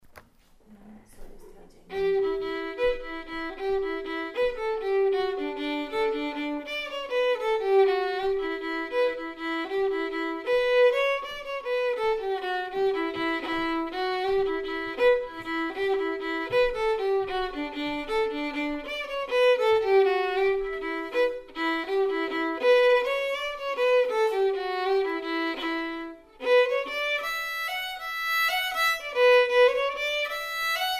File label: jig, fiddle; Swallow's Tail